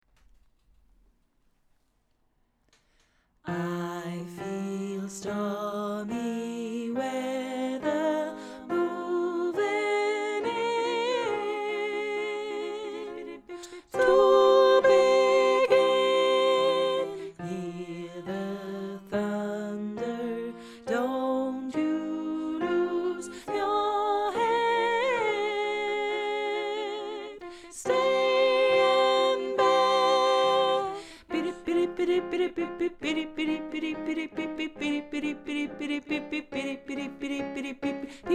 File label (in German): Sopr. 1 u. 2; Singalongs